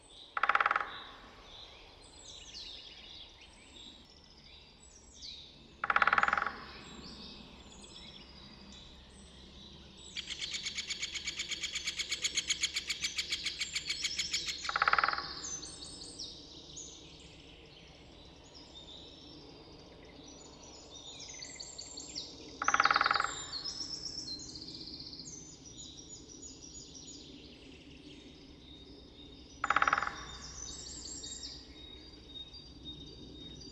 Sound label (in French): pic epeiche; chant